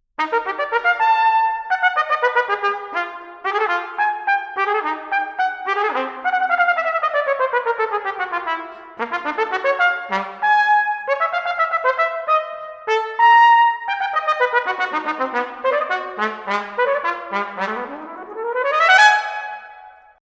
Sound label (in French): Trompette